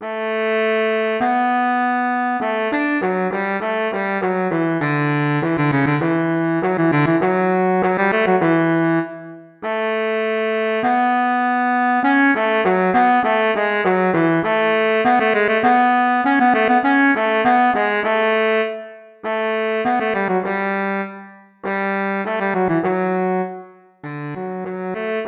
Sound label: Bari Sax; Band; transposed; UVM